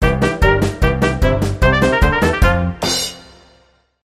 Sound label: Silly Belly Polka, Sting